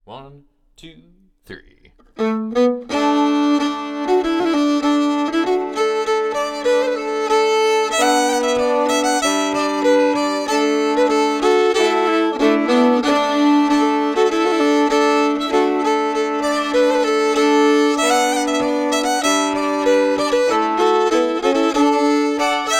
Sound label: march melody plus 3nd time harmony, fiddle